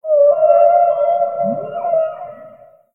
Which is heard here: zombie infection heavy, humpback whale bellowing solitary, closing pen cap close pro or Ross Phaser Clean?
humpback whale bellowing solitary